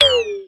duck pickup neg, halloween; Garry's Mod; tf2

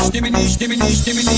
scared; skibidi toilets toilet team requeriments; skibidibop series

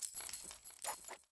pistol twirl; taunt; Garry's Mod; tf2

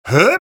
heavy taunt exert; vo; taunts; tf2

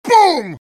meleeing; heavy

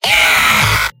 heavy pain severe; vo; tf2; mvm

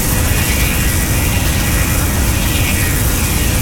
phlog loop; tf2

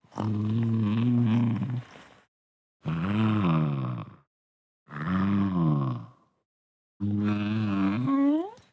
loop sleep; Garry's Mod; pibble spooky

